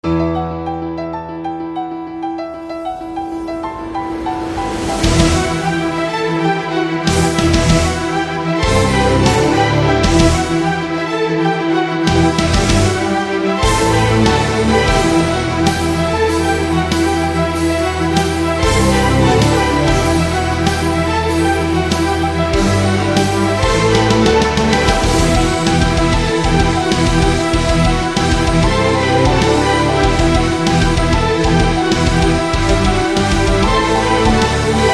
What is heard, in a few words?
Breaking News, epic music; epic music vol 1